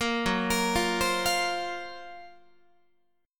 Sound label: F sharp Major 7th Flat 5th, down, Guitar; F#M7b5 arpeggiated; 13,14,13,15,16,16; 4A,4E,5A,5D,5G#,6C#; chord shape